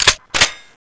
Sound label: spas12 pump